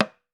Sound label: TAM TAM, African Drums